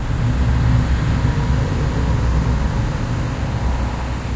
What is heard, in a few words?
sewer; hl2